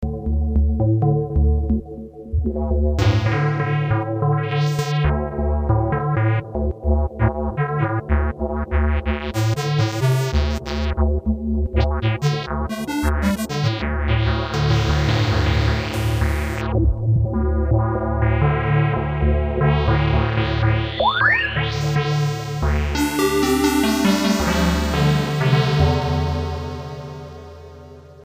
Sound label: jx synth